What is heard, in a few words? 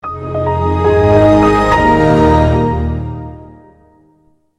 Windowstone